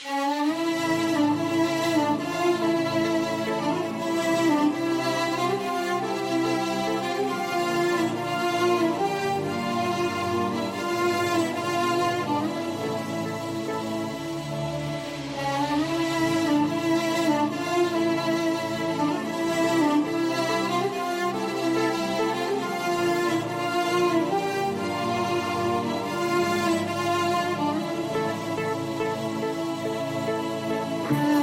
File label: Violin